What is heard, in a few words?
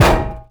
Chaingun Hit